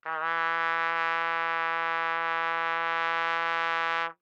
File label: Trumpet novib ff E3 stereo, Bb Trumpet; MIS Pitches - 2014; Brass